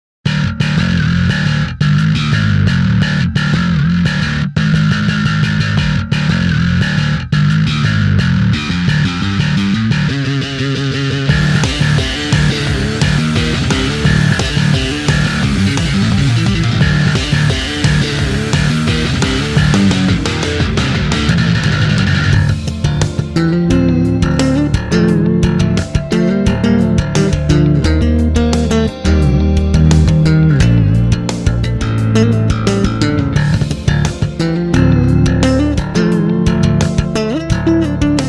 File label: metal whammy